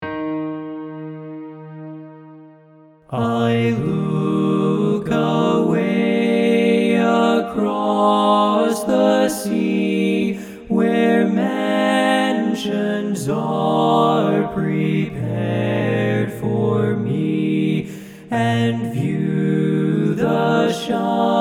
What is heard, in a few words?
TENOR